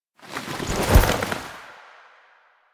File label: Comfort Foley Sit; Warframe; Jade Shadows; Quests